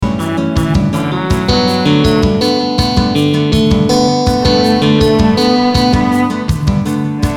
Clear guitar Stratocaster, Clear guitar strat dans l’arrangement; Fender Stratocaster; guitare électrique; Panoramique à droite.